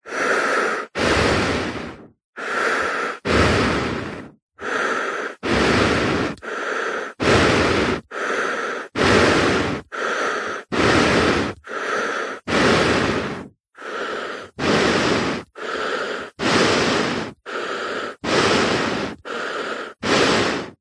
human breathing face surgical mask several deep breaths